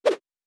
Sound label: Whoosh; Fantasy Sounds Bundle